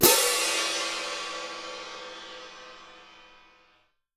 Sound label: CYM MF -L, Percussion; Miroslav Vitous - Percussion & Harp